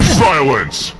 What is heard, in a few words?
fall; beelzabub